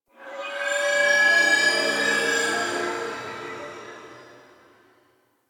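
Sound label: Gas Distant Energy One Off; Ambience; Warframe; Corpus Gas City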